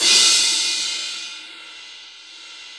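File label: CYM CRA, crash cymbal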